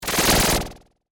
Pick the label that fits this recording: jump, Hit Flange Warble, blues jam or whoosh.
Hit Flange Warble